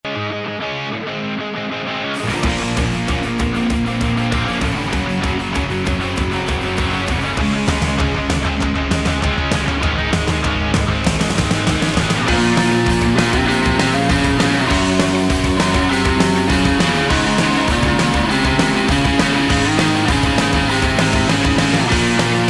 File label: metal